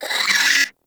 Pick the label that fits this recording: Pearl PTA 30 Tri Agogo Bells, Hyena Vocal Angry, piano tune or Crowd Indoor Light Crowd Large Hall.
Hyena Vocal Angry